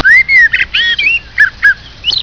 ROBIN

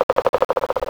Pileated Woodpecker drum

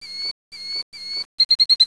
killdeer

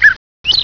ROBIN chirp

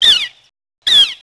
flicker call

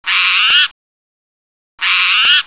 spot towhee mew

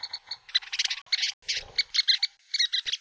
west kingbird

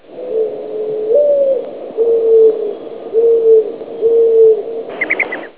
m dove

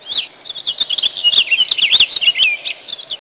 mountain bluebird

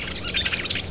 cowbird

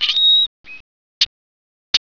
brewers blackbird

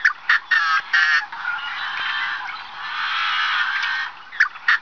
Yellow head blackbird

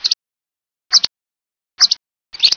hammond flycatcher

